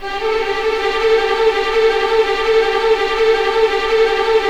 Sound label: RIVER; East West; Scoring Tools; ORC; River Flow; River Trills